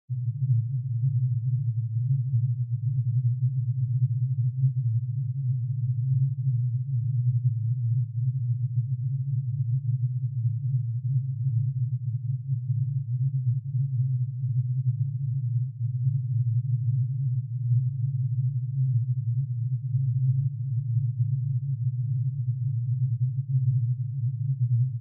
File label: Subwoofer phase 125Hz; Subwoofer Evaluation and Setup; Surround Spectacular